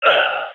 ct death; vo; gign

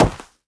step run, footsteps, player, carpet

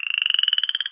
frog

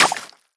spew, infestation, bulb

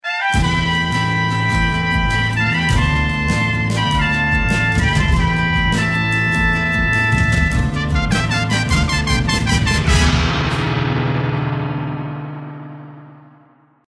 bomb ten sec count

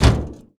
metal auto, player footsteps

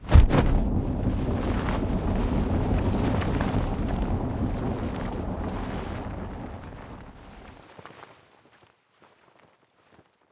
drop zone parachute success; Counter Strike Model Pack; CS:GO; survival

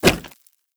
debris cardboard, bullet impact debris

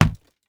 fist hit, flesh; physics

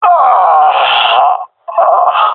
death, jungle male; player vo; Counter Strike Model Pack; CS:GO

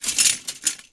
chainlink rattle; scripted sequences, a2

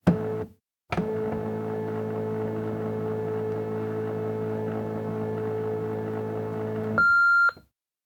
machine office, ambient; nuke

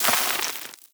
debris sand, bullet impact debris

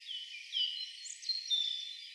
birds 1shot; animal

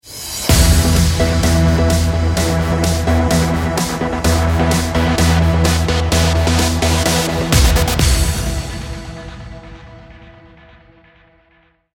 start action, music

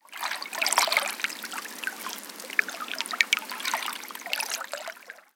ambience canals water lap

